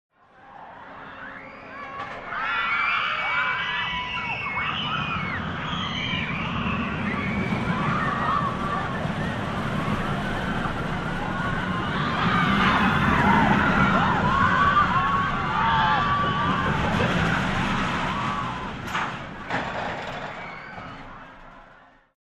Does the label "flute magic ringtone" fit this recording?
No